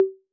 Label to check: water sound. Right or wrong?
Wrong